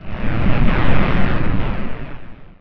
laser tank move start; Emperor - Battle for Dune

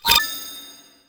armsrace level up; Counter-Strike Global Offensive

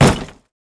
Hit Wood; GrandFantasia

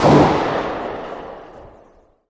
ump45 distant, weapons; Counter-Strike Global Offensive; csgo

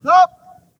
give orders disband; Hearts of Iron IV Death or Dishonor

